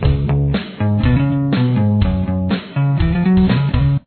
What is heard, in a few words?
bass